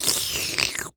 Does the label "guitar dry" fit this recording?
No